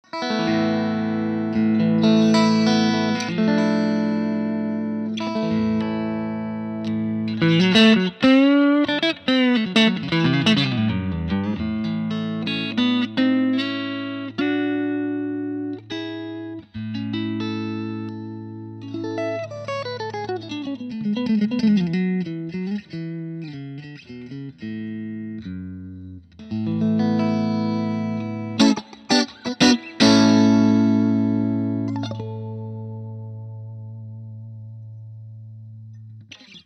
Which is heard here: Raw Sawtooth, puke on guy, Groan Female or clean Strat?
clean Strat